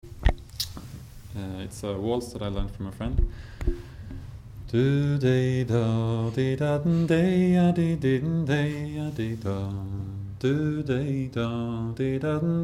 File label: Swedish Songs Waltz